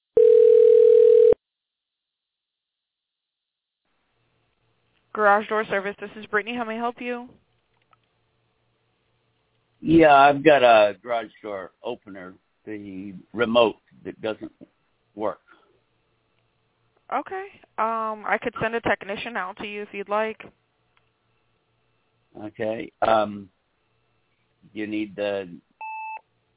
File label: Garage door